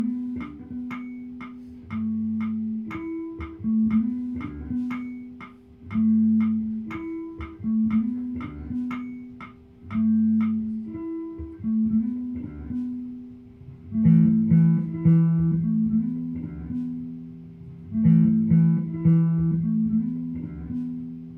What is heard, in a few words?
Loop